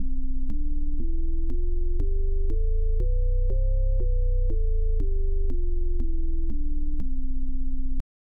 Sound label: c major scale bass click, hammond